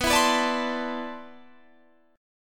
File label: C Major 13th B